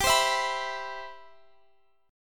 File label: C Major 7th, G, guitar chord